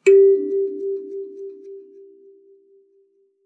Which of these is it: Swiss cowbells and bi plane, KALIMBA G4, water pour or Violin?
KALIMBA G4